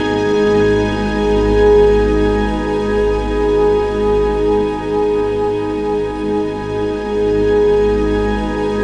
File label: WAVR STRNGS